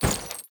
Temple Generic Footstep; Warframe; Foley

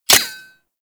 Pistol Mech; Guandao

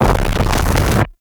Eruption Build Up; Warframe; Sargas Ruk

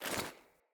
Foley Run Default, Default Clothing

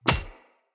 Death Default; Enemy; Sinecure; Melee Grunt; Death Effects

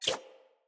Dagger Whoosh; Sinecure Dagger

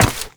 Step Ice, Footsteps, Walk; Warframe; Characters; Tenno

